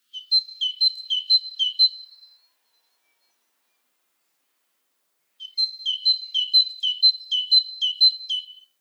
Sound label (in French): mesange charbonniere chant